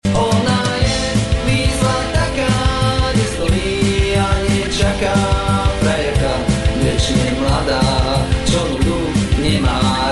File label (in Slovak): Hudba